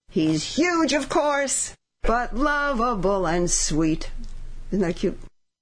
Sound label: sings